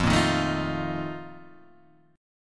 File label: Bb Major 7th Suspended 4th, F, chord